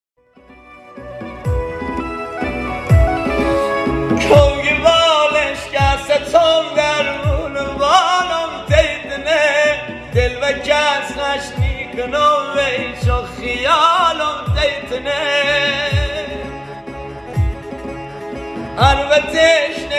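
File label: Lori happy wedding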